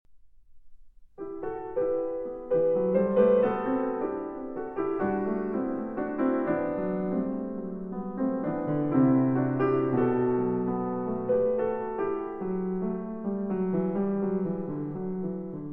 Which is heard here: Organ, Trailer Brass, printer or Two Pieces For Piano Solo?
Two Pieces For Piano Solo